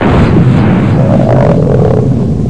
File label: foot